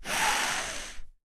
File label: idle sniff long; smite; god ganesha skin11